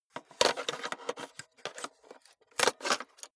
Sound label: cassette into cassette player struggling